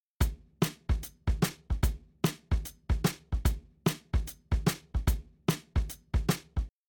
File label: Drums, shuffle drums; WDR2